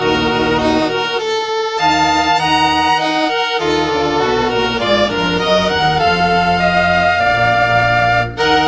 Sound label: Iphone4 Fanfare